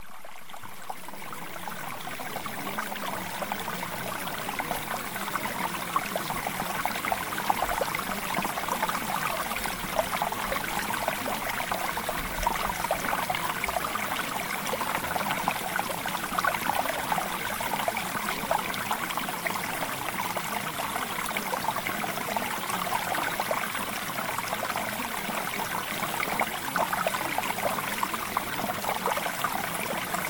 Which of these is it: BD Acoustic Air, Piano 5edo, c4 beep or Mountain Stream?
Mountain Stream